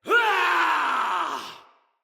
Battle Cry, Soldier Male; Dendrit

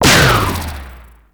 Sickle Heavy Combo; Warframe; Lasrian Soldiers; Weapons; Lasrian Butcher Sickle

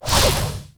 Scythe Charge Swing Whoosh; Weapons; Melee; Warframe; Archwing; Exalted Archwing Scythe

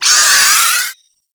Arm Vocal Wind Up; Warframe; ManInTheWall

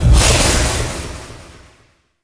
Heal Burst; Magistar; Warframe; Mace

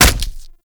Punch Impact Flesh; Warframe; Infested; Kick Punch

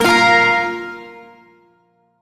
Shawzin Chromatic Chord; Music; Warframe; Duviri; Chords

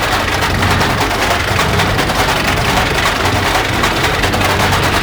Comet Pellet Damaged Rattle Loop; Gameplay; Warframe; Corpus Railjack; Comet Shredder

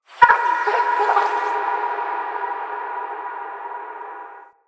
Small Creature; Ambience; Under City

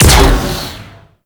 Alt Shot, Weapons; BE Tetra

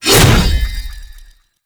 Sword Swipe; Warframe; Ballas Sword; Weapons Melee; Whooshes

